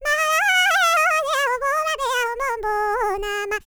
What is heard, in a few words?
BALKAN, World Voices; ILIO; Vocal Planet